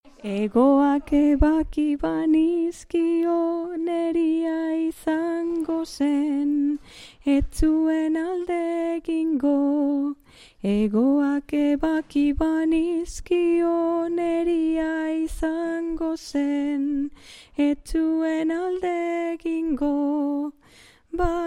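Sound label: soprano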